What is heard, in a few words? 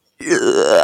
yuuuaaaahhh